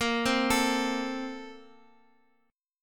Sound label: A sharp Major 7th Suspended 2nd, Guitar, Open F; A#M7sus2; arpeggiated; JGuitar